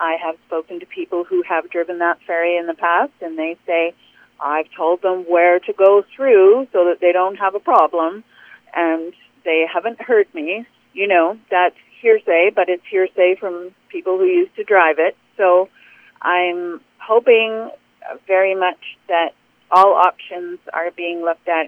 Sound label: narrows shallows hitting bottom